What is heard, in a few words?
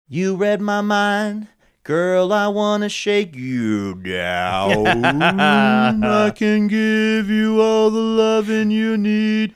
singing mimicking key tempo change